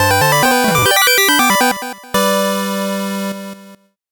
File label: 8bit jingle normal